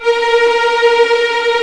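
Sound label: A#4 tremolo, String; East West; The Ultimate String Collection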